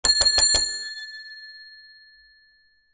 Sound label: BELL DESK